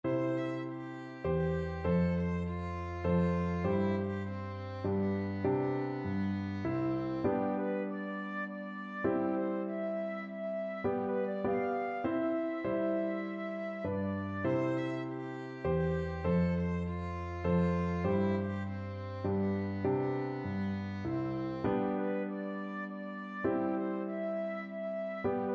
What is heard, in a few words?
minuet, violin flute duet